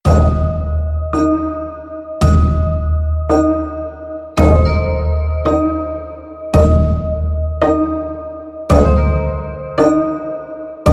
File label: BGM Ringtone